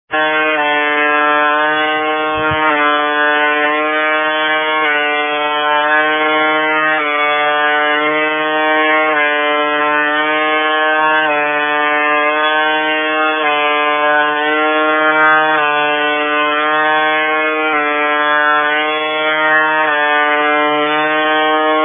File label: wild buzzer